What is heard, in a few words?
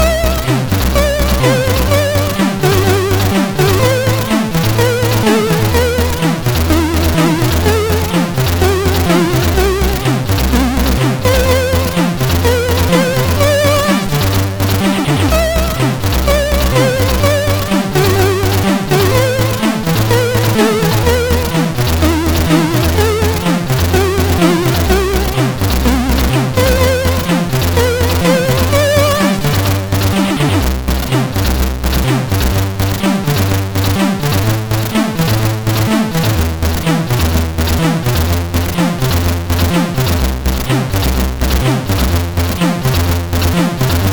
Russian Anthem sid CSG8580R5